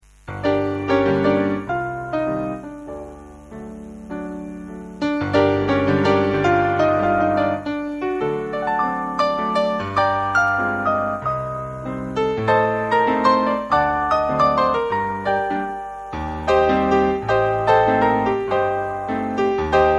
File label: piano popular; Eigen gespeelde melodieën